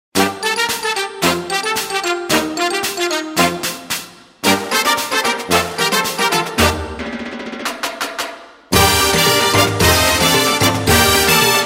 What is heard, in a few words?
rap hits show, no voiceovers